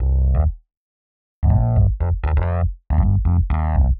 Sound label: Rhodes Bass C; PowerFX - Nu Jazz House